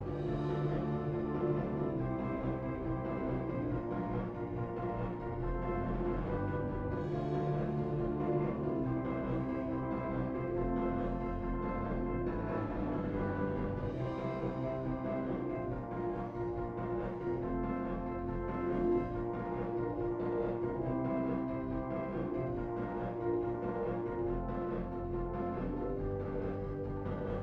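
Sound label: Speaker Disco Distant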